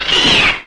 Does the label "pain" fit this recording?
Yes